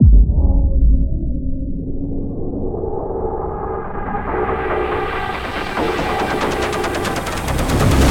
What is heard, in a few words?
Boss Music Build Intro; Voidbreaker; ps5 windows xbox series xs